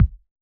hip hop kit kick

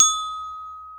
D#4 glock; InVision Interactive; Lightware VOL-1; Instruments & Percussions; GLOCKENSPIEL

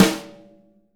BRUSHES, Living Drums

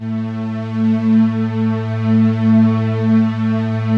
PROPHET VS, Vintage Keyboards

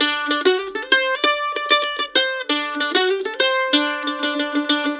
LICK, Blues Guitar

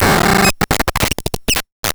Glitch Rhythm, Drum Machines; Cwejman S1; AFG Prikle; 300 Drum Machines; DevSnd Cwejman S1 - JK

